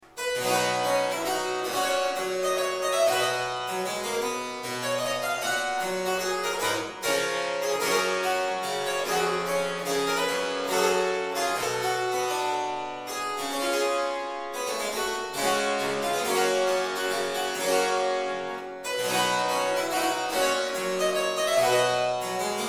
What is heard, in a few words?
courante, ruckers double